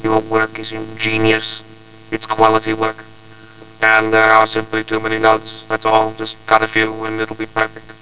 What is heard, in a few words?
lpc impulses